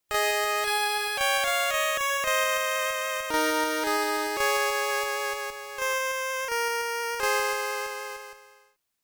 Game Over

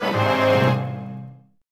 Enemy Capture

